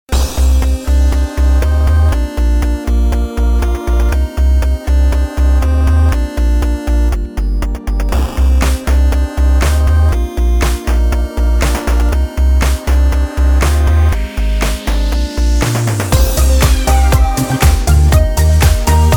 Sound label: music menu